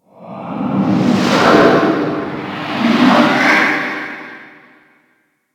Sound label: Infested Distant Breath, Ambience; Warframe; Corpus Infested